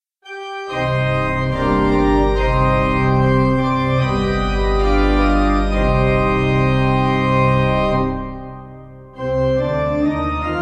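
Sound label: pipe organ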